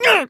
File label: "ngh"